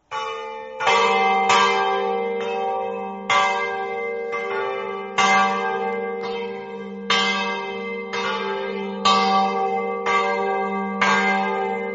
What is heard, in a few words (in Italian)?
duino, campane